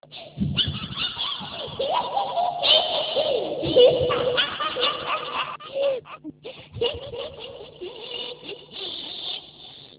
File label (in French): rire sorciere